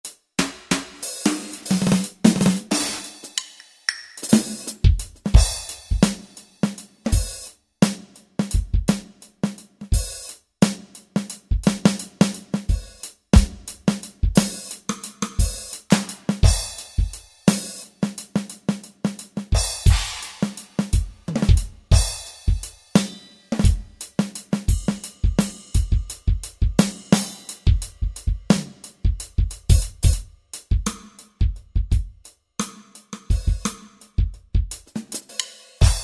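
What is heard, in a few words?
Pop Kit, synth drums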